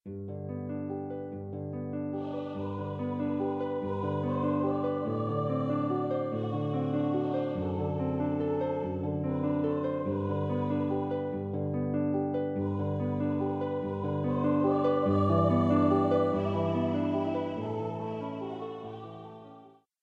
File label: Voice and Harp; on wings of song